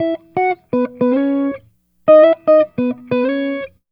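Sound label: 114 Jazz Guitar Lick E major, Fusion; Pro Tools Essential Loops; Digidesign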